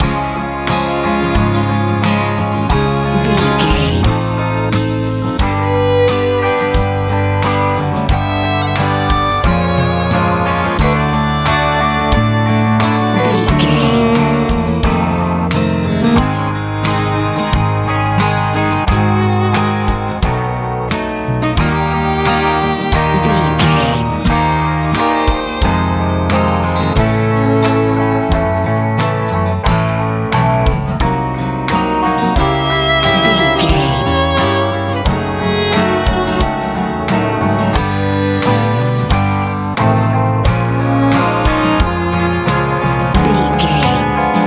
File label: blooming nice wind sprint life pop rock